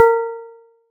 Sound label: gong hit high metal